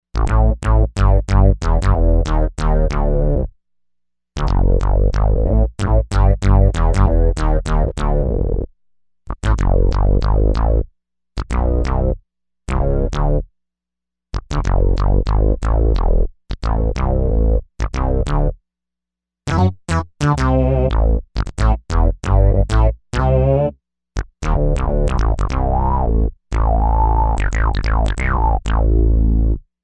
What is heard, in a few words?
Mondo Bass